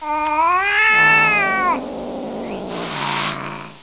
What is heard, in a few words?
cat yowl; cstrike